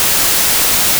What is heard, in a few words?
noise